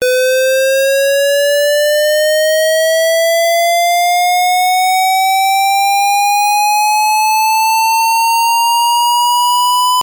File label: AVR EB TCF, NCO Frequency Waveform Generation